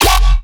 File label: bass hit Schwomp F; Virtual Riot